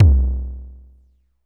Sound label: TOMTOM, DRUMS; Music Store Sound Service; Einstein